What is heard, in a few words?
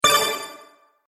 Sparkle Strike, magic sound effects